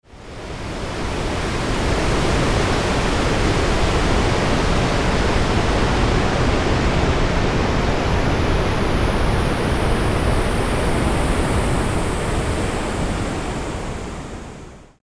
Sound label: Ocean Waves and Sweeping Pink Noise; with ocean sounds